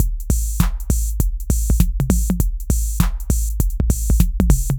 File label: drloop, drumloops, Vinyl Attack; Masterbits